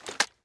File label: reload empty end, AR-15; Assault Fire